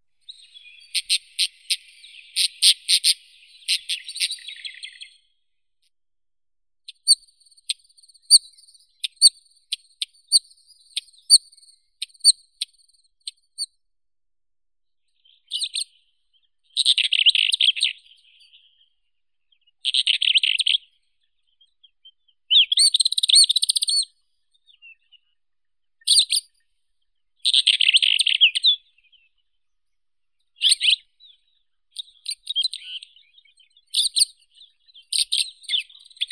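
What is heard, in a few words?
Spanish wheatear, Oenanthe hispanica; kamenka ispanskaya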